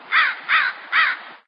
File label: Crow